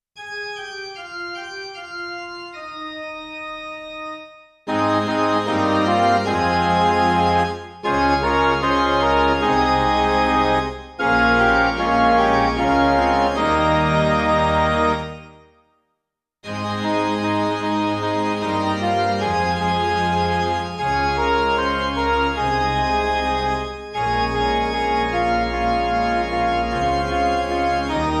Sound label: midi